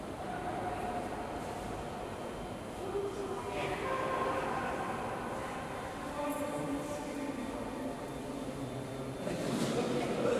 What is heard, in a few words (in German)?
kinderlied, darmstadt hbf